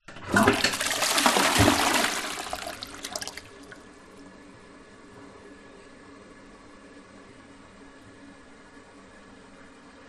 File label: household toilet flush half eco